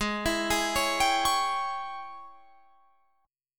G sharp Major 7th Suspended 4th Sharp 5th, Guitar, Drop D